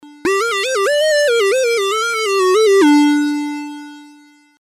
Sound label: solo; reaktor